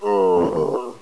zombie die

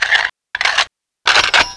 reload; cyclone

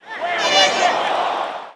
horn, crowd

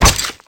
hit flesh, knife; csgoknife

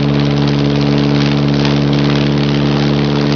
prop normal

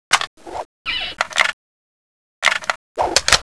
arrow reload, weapons

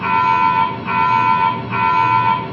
warn

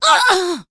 Pain, elven female

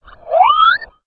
alert, houndeye

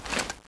shoulder out; PD2 common

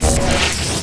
gravity gun drop

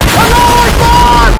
boom, debris

